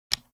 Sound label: crossbow load; Minetest; VoxeLibre